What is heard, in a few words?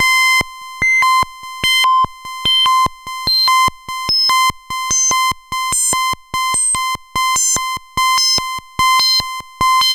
RHYTHM FM